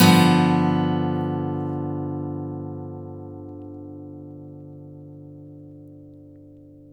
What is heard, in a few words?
Blues Guitar, BARI CHORDS; ILIO; Fretworks